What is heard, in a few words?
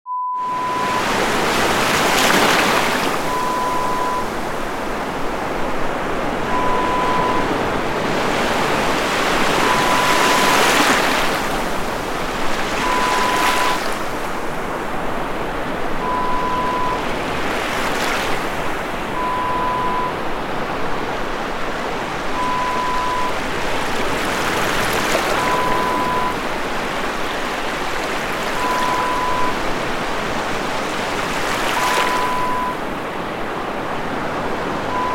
Beach Waves